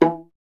viola E; Miroslav Vitous; Solo Instruments VOL-1

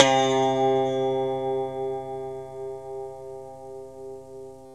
GTR KINK, Clean E.Gtr; Roland LCDP02 Guitar and Bass; Hookin'Pick

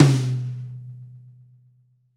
Rock Tom 50, Roland BK-5 Toms

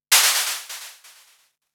Gated Cymbal; musicradar

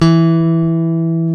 Basses, Roland

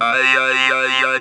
"ay ya ya", Talk Box; E-MU Producer Series Vol. 8 – Platinum Phatt

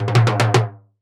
Timbales Fill, Roland BK-5; Percussion

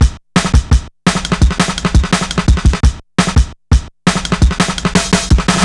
loose connection, Drum Bass Drumloops 170bpm; Zero-G; Total Drum Bass

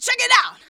VOX VOX; Sound & Vision - Gigapack II CD 2

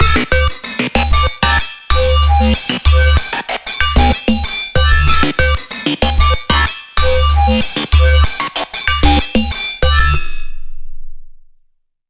plinker, bass

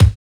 Dirt Kick; Drums In Your FACE!

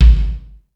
POW TOM, ACOUST TOMS; Greytsounds

